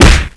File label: baseball bat strike